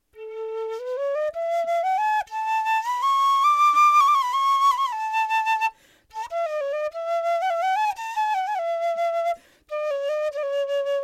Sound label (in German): schilf quer